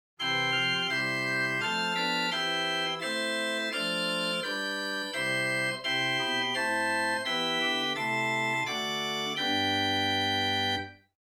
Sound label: Gedackt Rohrflote Oktave, chamber organ